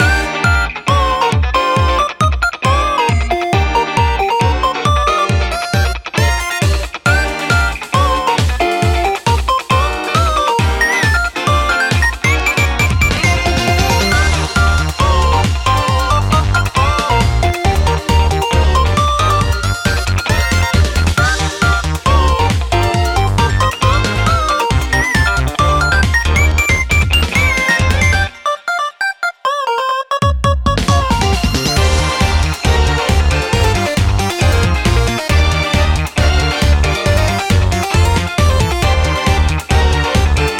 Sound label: loop